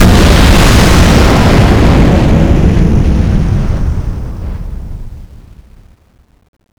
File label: nuke; madcars2; radicalplay